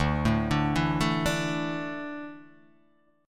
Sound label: D Suspended 2nd D down